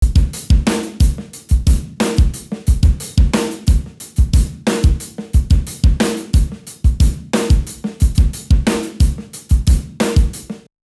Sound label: drum loop groove 90